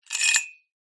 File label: small metal object coin slide inside metal pole; foley